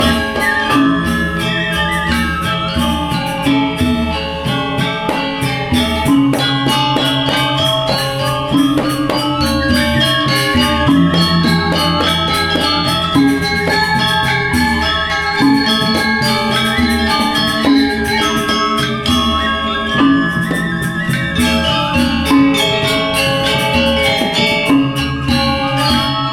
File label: dance; Manamon Music; Manamon 2